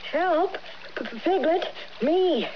pig help

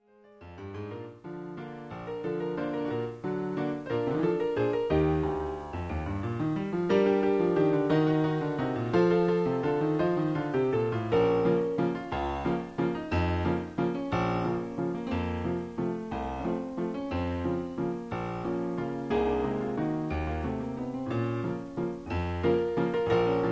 waltz

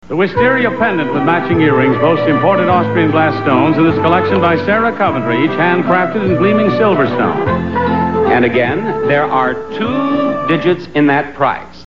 waltz

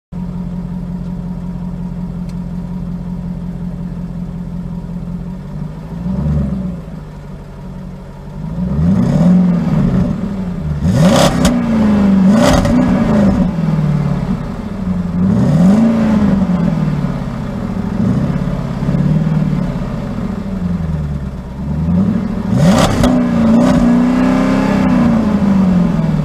Engine Rev Sound Effect; Traffic Light

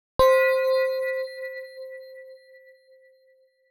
bell

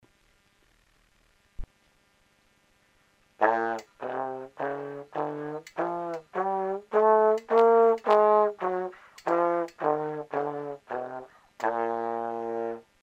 tbone scale

